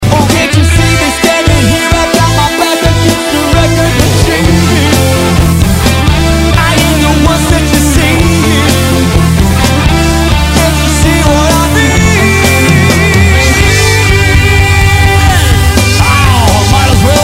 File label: variety bands